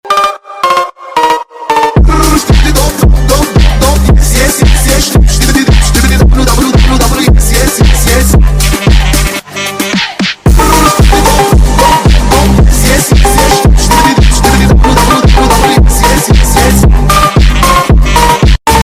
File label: Phonk Remix; ringtone